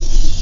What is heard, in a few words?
door; quake2; mnhcoop